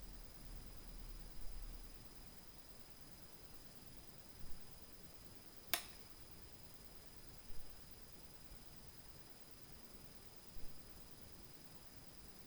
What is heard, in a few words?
Recorder TIC NOISE, Night Noise